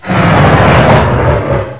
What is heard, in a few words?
die; svencoop; aomdc; face